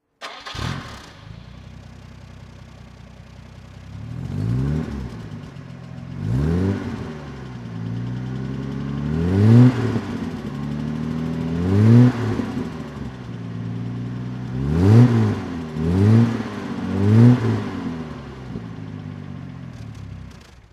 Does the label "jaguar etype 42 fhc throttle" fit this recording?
Yes